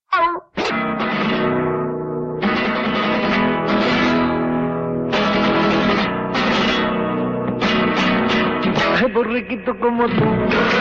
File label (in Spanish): Canción Infantil